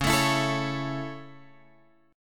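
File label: D flat Minor Sharp 5th, Guitar Drop D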